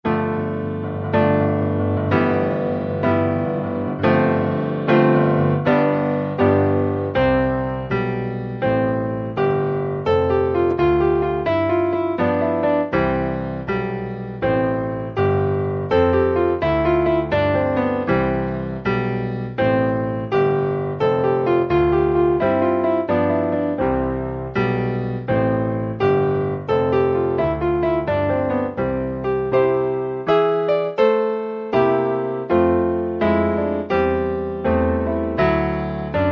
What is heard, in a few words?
grand march, piano